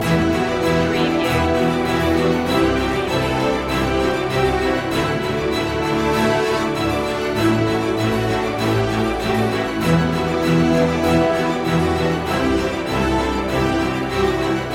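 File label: no choir no percussion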